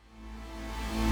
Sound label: Future Bass All Synths Reverse